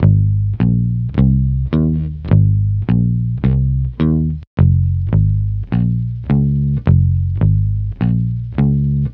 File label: Multi Guitar Swing Bass-G#, 105; Zero-G Kocktail Kollection; Construction Kitz (Lounge)